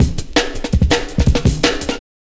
Drum Loops, Jungle

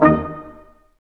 BRS Quintet staccato; Roland L-CD702

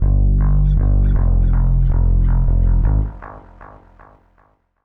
McBass; Zero-G Smoke

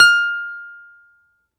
BALALAIKA, Russian Strings

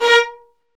STR, Roland String Master Series, Vls Marc

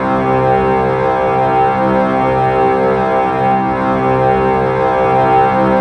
grind pipe, foggy drones; Spectrasonic Distorted Reality 1

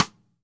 Radio King Cross Stick; Sonic Foundry (Sony Creative Software); Vital Drums

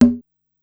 Conga Mid, Korg Wavestation-SR, Drum Kit; Wave 455 - Drum Kit

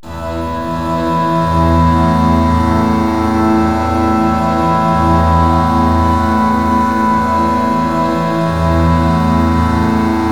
ORGANUM

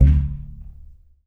TUB BASS D 1; Country

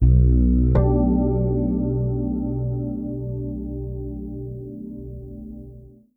FRETCHORD B, PEDULLA BASS; Masterbits CLIMAX 7 - Bass

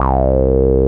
A BASS; CMP - Analogon

